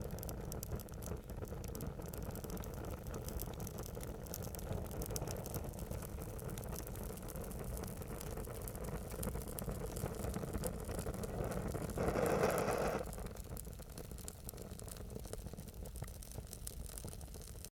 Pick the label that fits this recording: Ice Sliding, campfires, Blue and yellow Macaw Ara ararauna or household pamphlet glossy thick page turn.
campfires